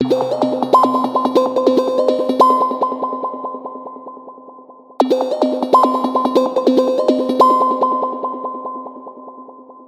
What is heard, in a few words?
Minimal